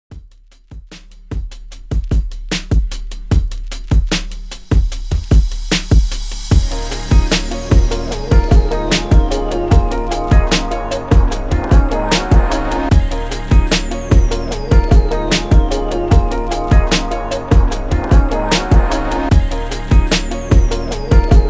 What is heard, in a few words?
REDRUM, 75 BPM